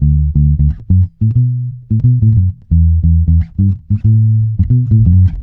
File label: D, dub, bass; Spectrasonics; Bass Legends CD 1; JOY DUB